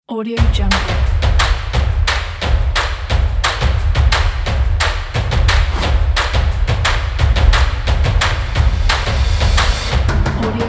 rhythm

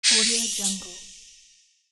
anime dash; bogdansfx

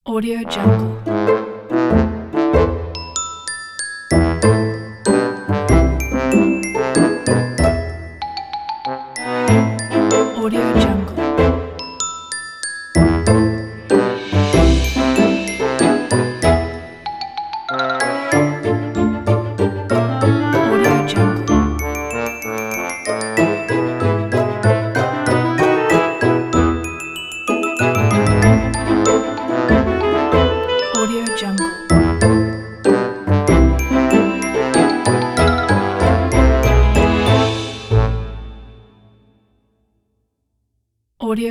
funny awkwardness

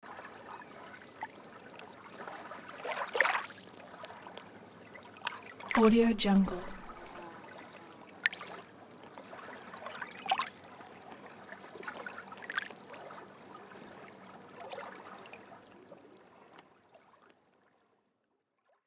sea waves on beach

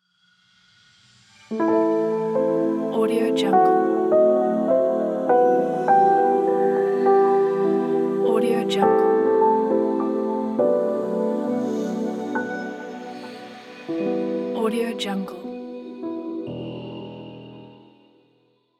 piano sentimental logo

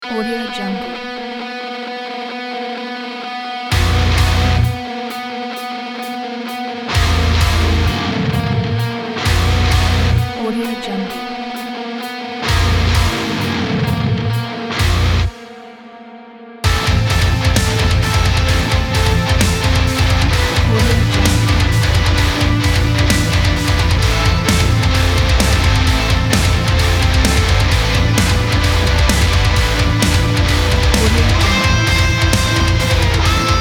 epic powerful energetic metal

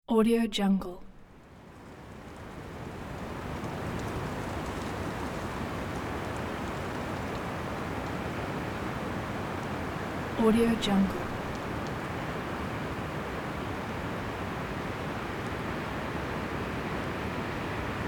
high heavy wind

